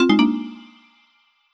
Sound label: Popup Not Enough